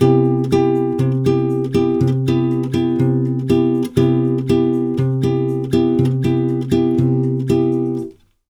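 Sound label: 120 guitar C, brazil bossa; USB Soundscan; AKAI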